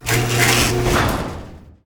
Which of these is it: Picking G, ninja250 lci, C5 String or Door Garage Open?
Door Garage Open